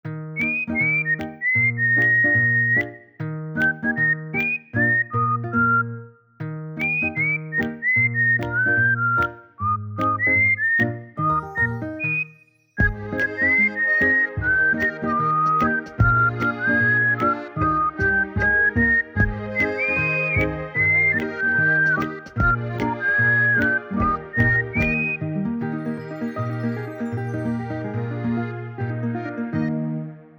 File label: Whistle; Lenovo; P2